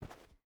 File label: footstep rubber boots cloth walk; Rust